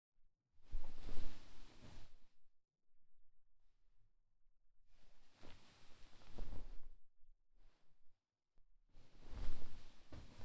布団を捲る→かける; KU100; 布団の音

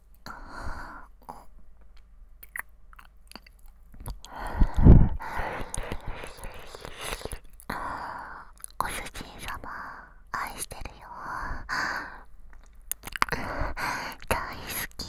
耳舐め 喘ぎ

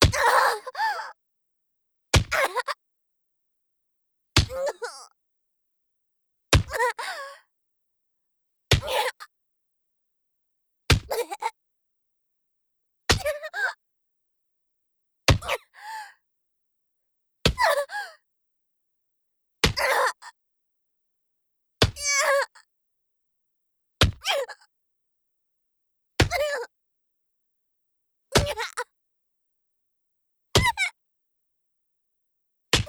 フック BPM110 ワン; 効果音あり

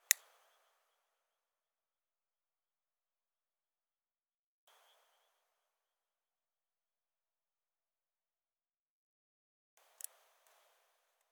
手持ち花火噴射; 夏実と縁側花火デート

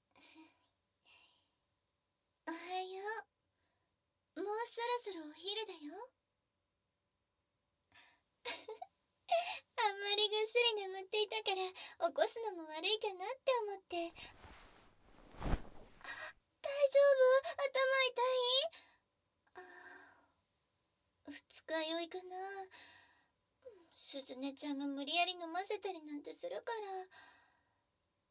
琴音の耳かきふーふー, ASMR

